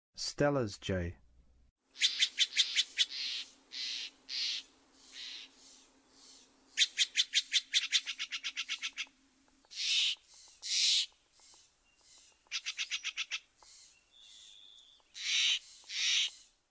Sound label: Stellers Jay; North America